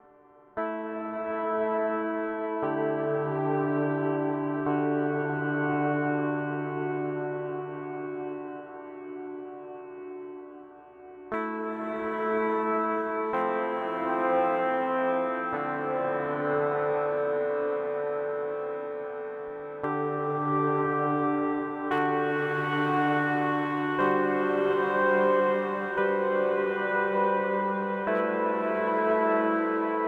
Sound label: Eventide Blackhole Large